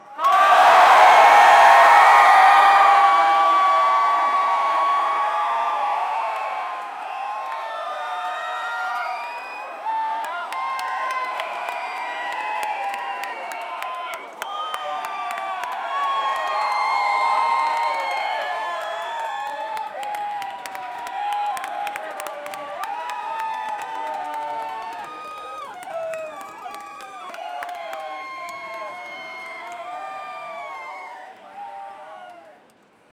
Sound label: crowd cheering loud; applause